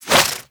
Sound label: Tesla Ball Toss; Trapper